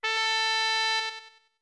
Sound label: Notes Pipes A long; Deku Pipes (long)